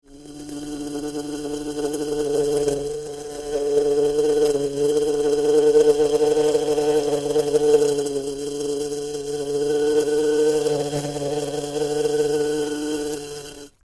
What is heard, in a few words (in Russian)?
zvuki shmelia